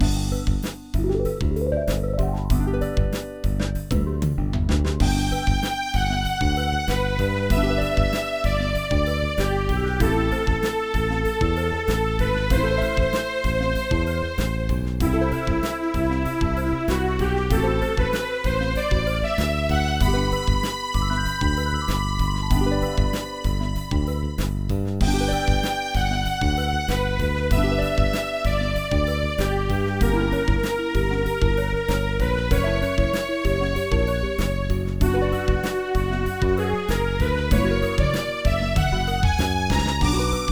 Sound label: Bird Board; Digimon Story Lost Evolution; NDS; Bird BGM Board